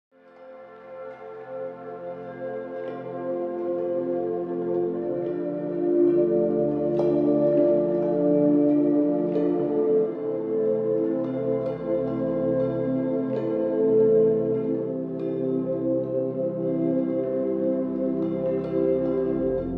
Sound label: lullaby of ballad